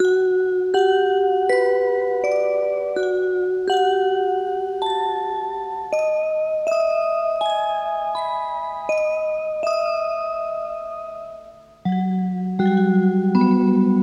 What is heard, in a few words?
Cassette Mallets